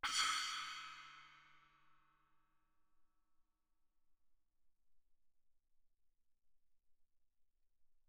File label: splash mallet mf, suspended cymbals; Percussion